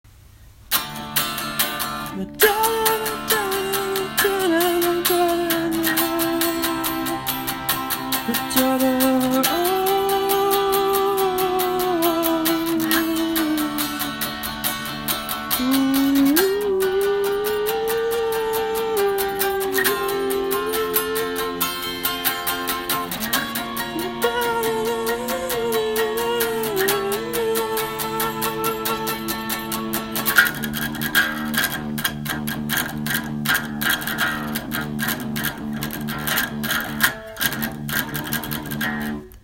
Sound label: Lynch mob chorus with orchestral djent